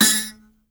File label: MBIRA A 3; BS MBIRA2; Heart of Africa